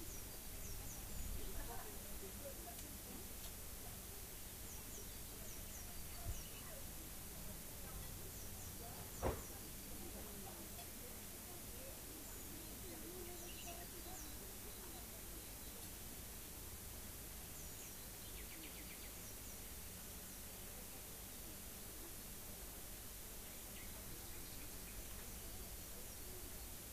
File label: rayol farm; SEAMED